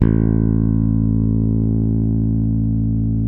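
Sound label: Spector bass A0 hard; Northstar - New Gold VOL-1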